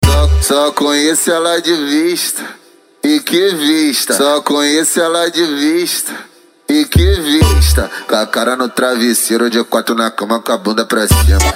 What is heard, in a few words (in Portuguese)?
dance e funk mega bass